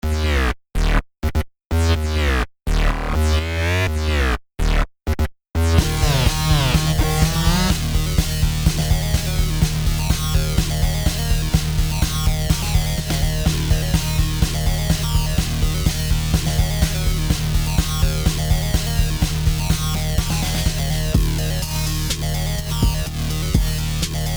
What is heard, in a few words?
zombie hip hop